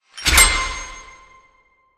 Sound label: place Scatter In Mechanism; lost relics